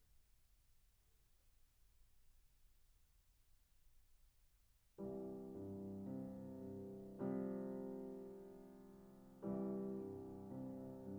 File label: PIANO